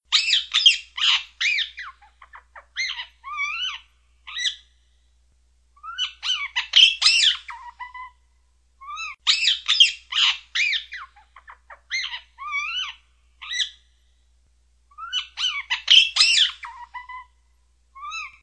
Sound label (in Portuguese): Canto do Periquito Ring neck